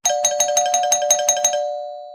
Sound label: correct